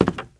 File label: squeaky wood walk, step, bamboo